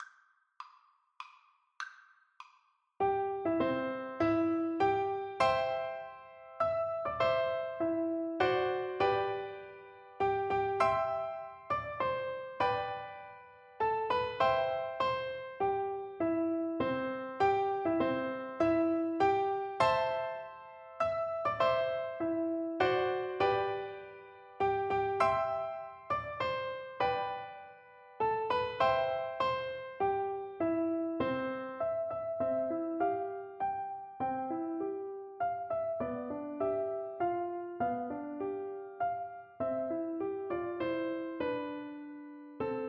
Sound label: Piano Duet